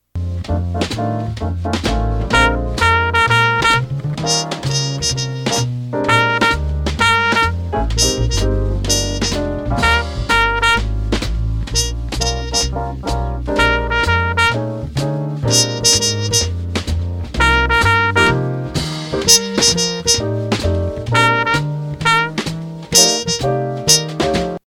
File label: rhythm